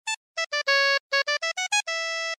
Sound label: sea shanty